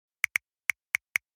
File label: Smartphone texting style short